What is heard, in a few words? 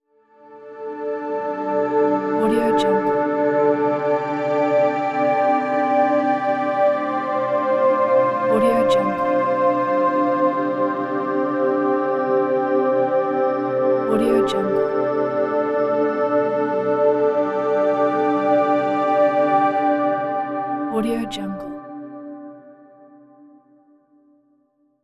dreamy atmosphere pad